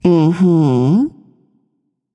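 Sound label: nonverbal agree; smite; voicepack; izanami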